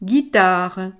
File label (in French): guitare